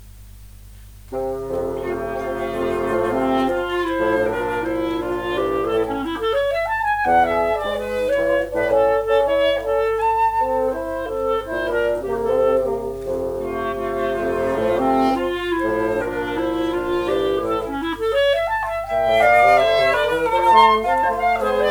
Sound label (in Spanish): Danza Cubana; Cervantes - 5 Danzas Cubanas (Fl, Cl, 2Bsn); Psst!